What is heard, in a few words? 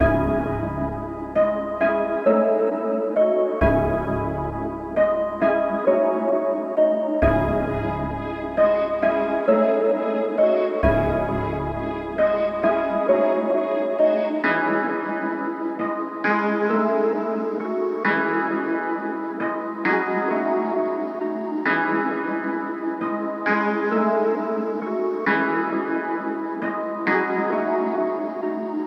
Harm s Way Melody; Cymatics